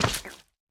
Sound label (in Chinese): 蜂蜜块踩踏声